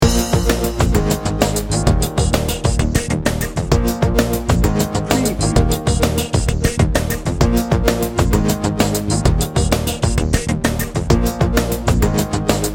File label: soul electro loop